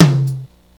verby tom drum sound c key, Royality free tom drum sound tuned to the C note.